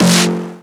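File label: Fx snare drum, Roland MC-303; Drum Samples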